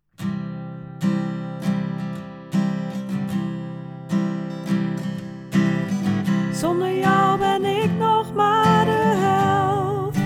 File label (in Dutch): hoge stem